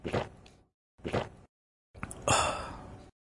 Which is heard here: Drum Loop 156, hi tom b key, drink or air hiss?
drink